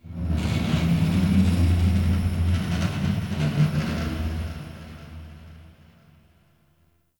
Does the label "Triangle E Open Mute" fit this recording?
No